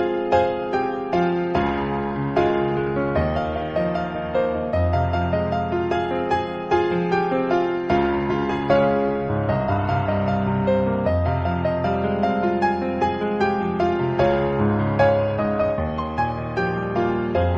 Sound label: instrumental song